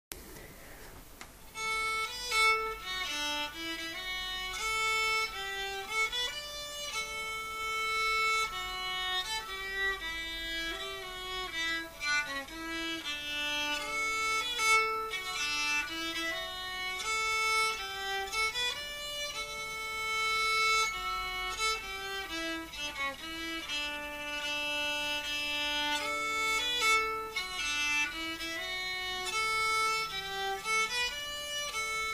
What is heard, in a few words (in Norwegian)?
Haugalykkjin førstestemme langsom